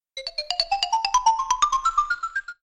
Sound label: ladder